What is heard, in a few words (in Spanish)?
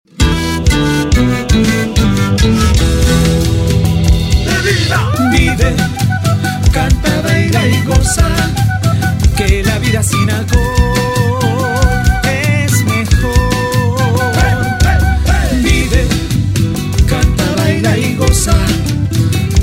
Jingle saya prevención; OZ SJO Puno